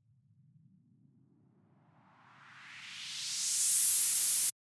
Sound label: noise sweep